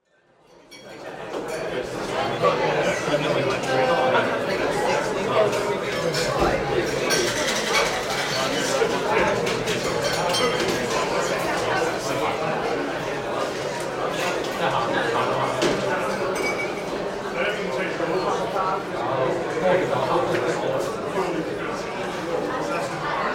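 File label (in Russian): плотный шум все люди говорят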